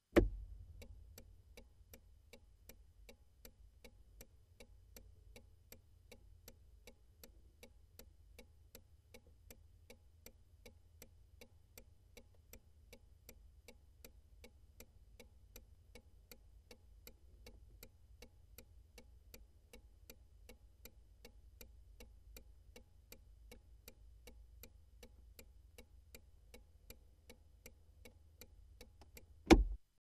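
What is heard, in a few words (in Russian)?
включение световой аварийной сигнализации аварийка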